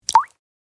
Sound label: Water droplet plop; Liquid